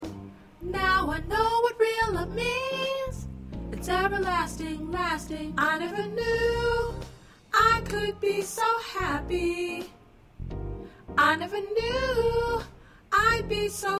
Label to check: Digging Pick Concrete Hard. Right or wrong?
Wrong